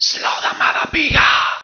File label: slowdown low